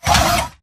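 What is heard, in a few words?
Stalker Movement Servo Heavy Short; Titanfall 2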